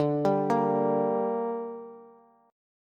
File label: D Suspended 4th Sharp 5th D down Bass, chord; arpeggiated